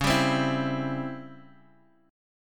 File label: C sharp Minor Major 7th Flat 5th, Guitar, DADGAD; 11,10,10,9,x,x